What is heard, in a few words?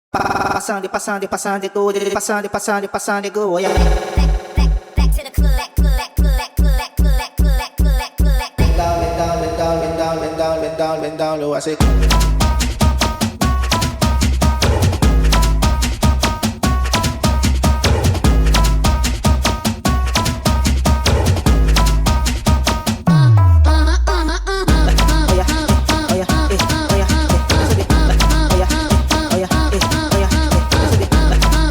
sped up pitched